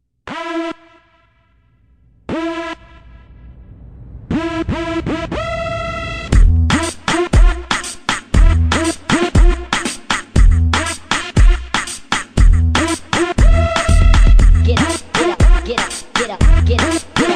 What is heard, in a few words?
Bas